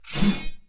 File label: dodge